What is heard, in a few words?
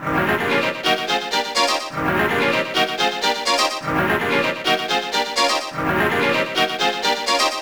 rave sequence - D sharp - 126; synth loops